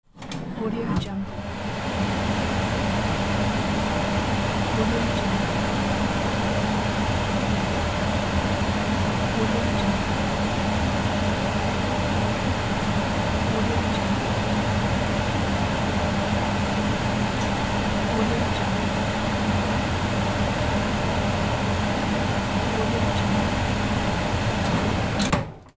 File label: cooker hood